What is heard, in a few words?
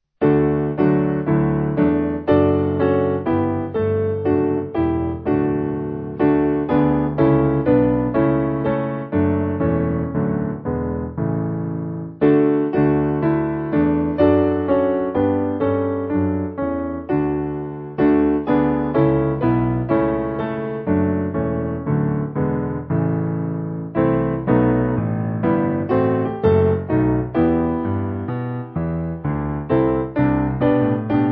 Piano, Free Music Backing Files for Churches; Rinkart